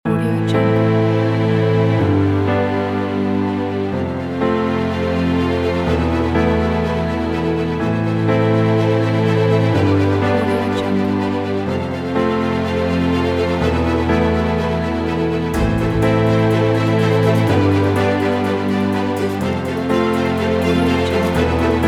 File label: exciting orchestra